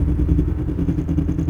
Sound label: Drone Ambient; Drums & Drones